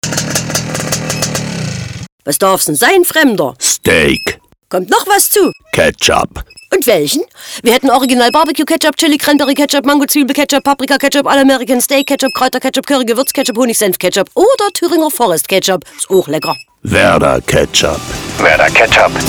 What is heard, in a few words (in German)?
Nur Sprache Effekte Moped Squeek Musik; Radiospots